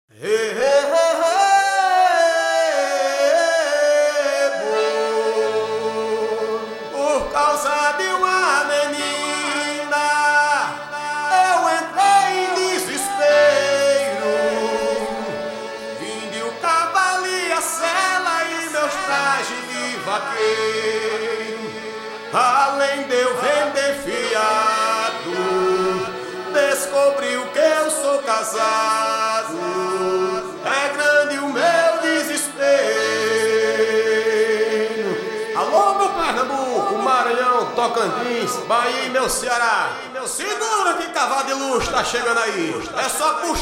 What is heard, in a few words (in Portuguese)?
toadas, Forró; previa cd vol 1